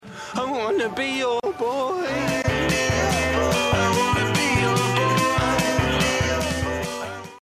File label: Chill